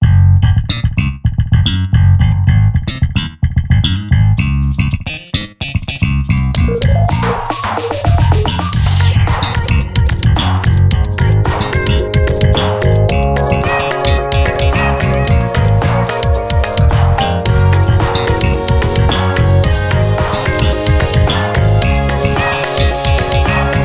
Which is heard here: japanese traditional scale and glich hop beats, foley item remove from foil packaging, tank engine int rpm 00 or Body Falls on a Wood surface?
japanese traditional scale and glich hop beats